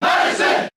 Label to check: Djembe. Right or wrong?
Wrong